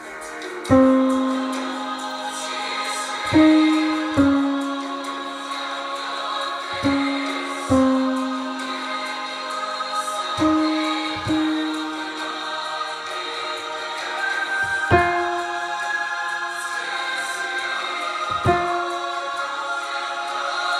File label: Tenor